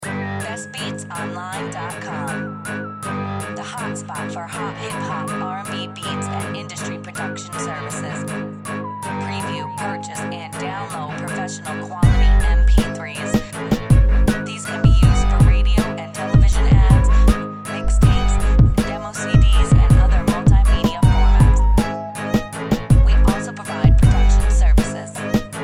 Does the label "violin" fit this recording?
Yes